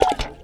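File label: WASHEE BEL, percussion metallic; Rarefaction; Poke in The Ear With A Sharp Stick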